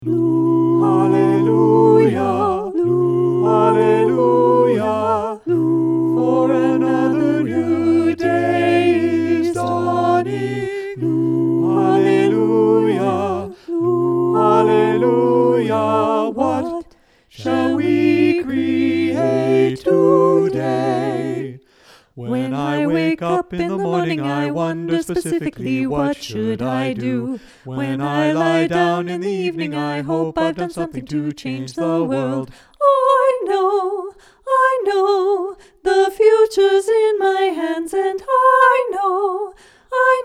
tenor high; All (with HIGH version Tenor part); 2023 Fall Repertoire

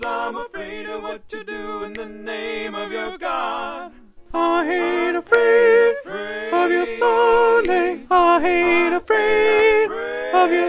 descant alto

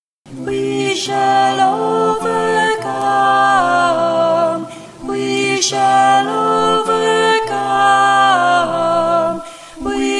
We Shall Overcome soprano

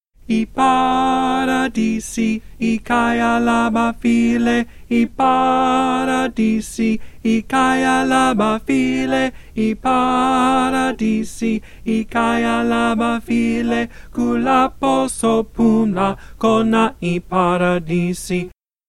Ipharadisi tenor

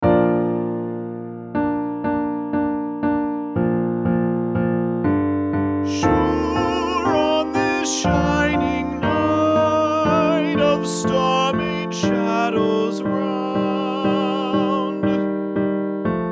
Tenor

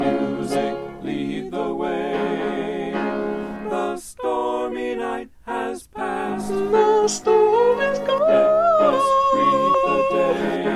solo soprano

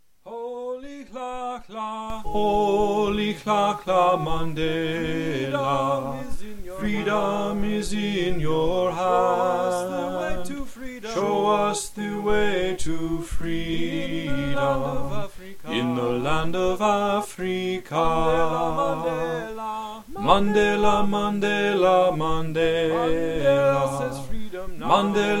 melody

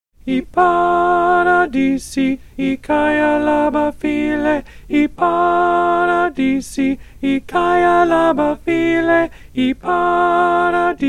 Ipharadisi alto

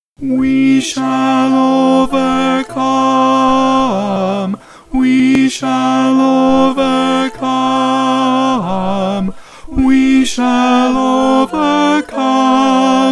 We Shall Overcome tenor

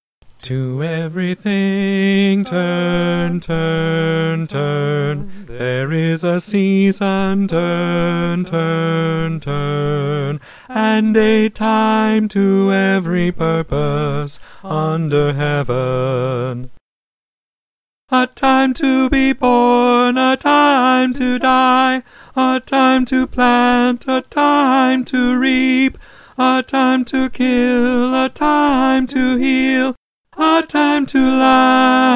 melody

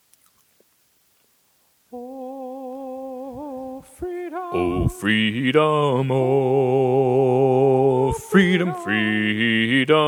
Oh Freedom bass, choir

